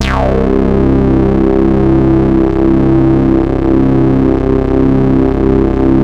Moog bass, synths

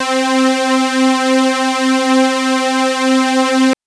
Synth Saw Pad c3

